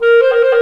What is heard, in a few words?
THE CLARINET